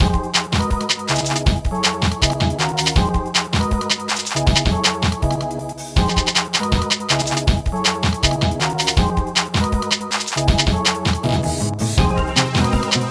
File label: Drum and Bass Mix; Necro; Street Fighter III 2nd Impact